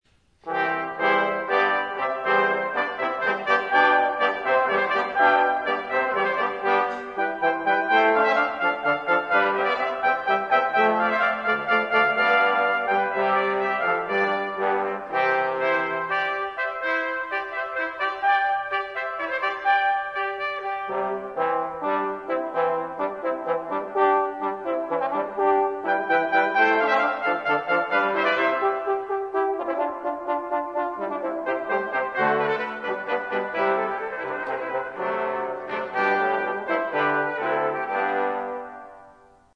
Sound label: hornpipe; handel